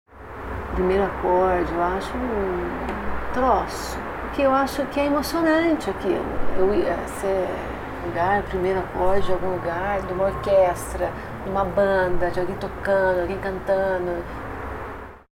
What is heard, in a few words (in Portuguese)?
Primeiro Acorde